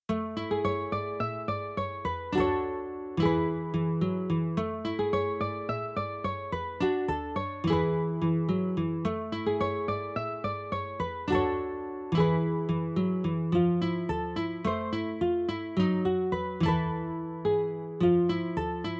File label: B3 Fantom XR GM Mode